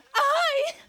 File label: Scream